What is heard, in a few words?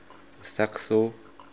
saxo; beer